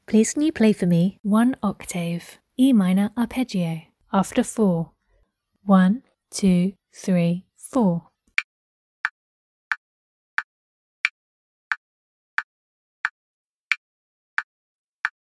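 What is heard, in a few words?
organ, E minor arpeggio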